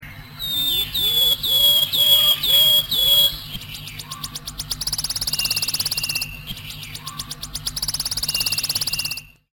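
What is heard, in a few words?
wood warbler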